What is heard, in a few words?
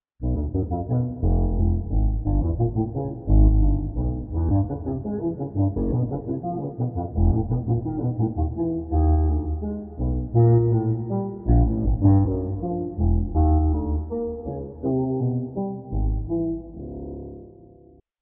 exercise, contrabassoon